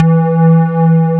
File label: SQUARE E4, KORG 03; USB Soundscan vol.49; Analog Fantasies; AKAI